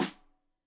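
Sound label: Snare Stereo, Drums; Deeply Sampled Drums; SMDrum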